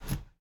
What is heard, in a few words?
driver foley wheel turn; Blind Drive